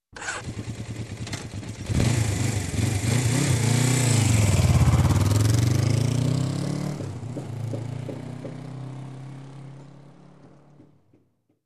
Motorbike driving away

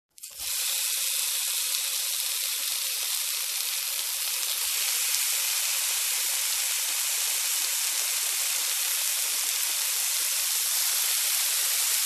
Frying

